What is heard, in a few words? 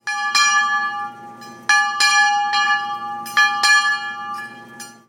Dinner Bell; Capon